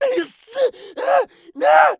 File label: scav death, voice; Escape from Tarkov; scav2